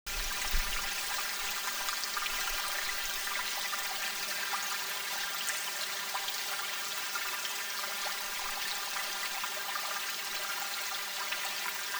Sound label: ambient